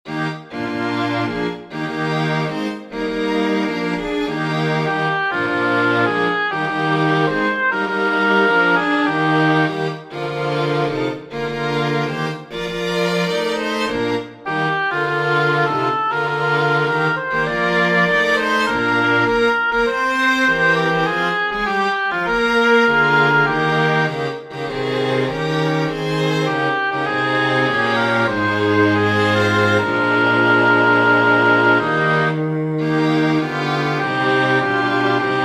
for oboe strings; schumann robert; freisinn

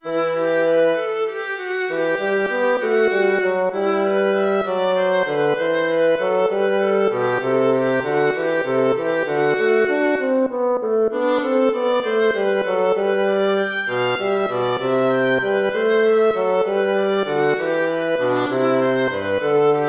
gigue

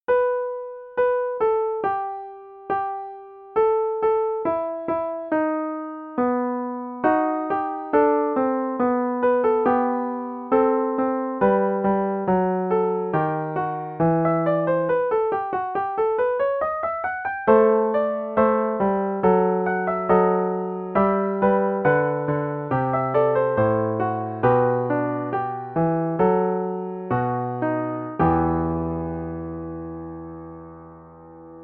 fugue

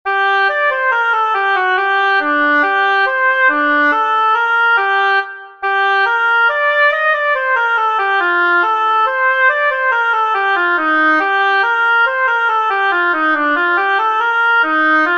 for oboe; vivaldi antonio; deposuit potentes from magnificat minor

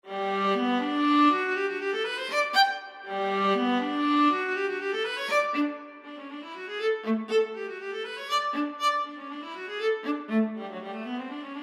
intermezzi animato

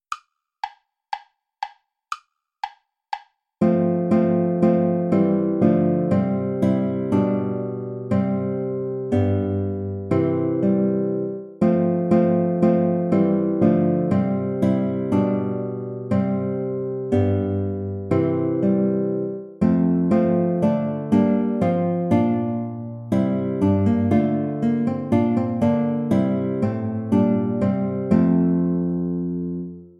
bransle play along without guitar; praetorius michael gentil

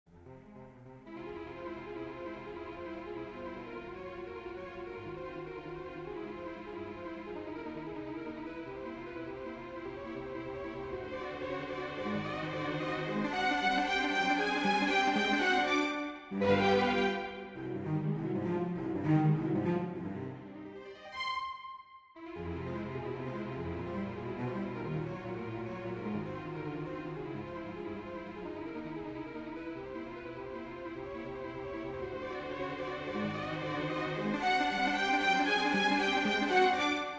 string quartet; Studie

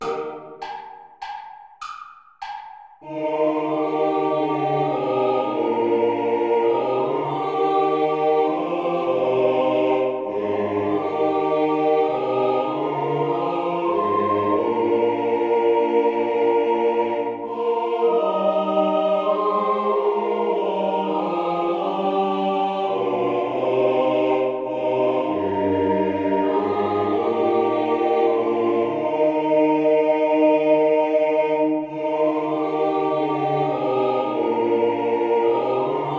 play along without tenor

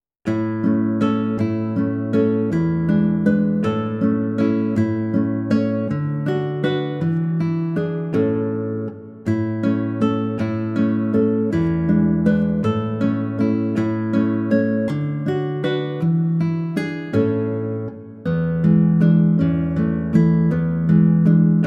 guitar method lesson

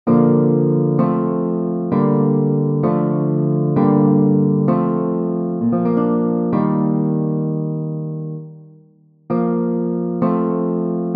chorale for guitar; bach johann sebastian; nun ruh erla ser der gruft

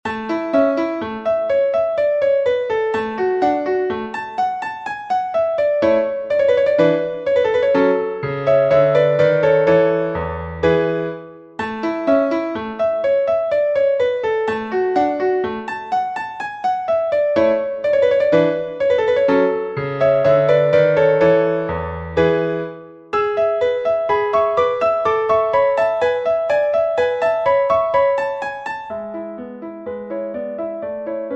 polonaise

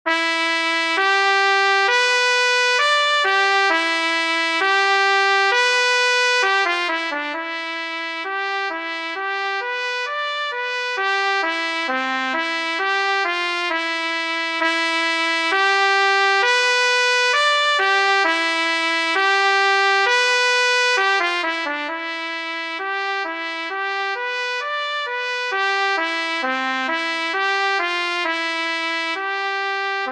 trumpet tune fanfare; gurlitt cornelius

